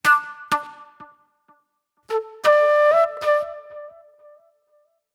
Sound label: Sharp Delay Flute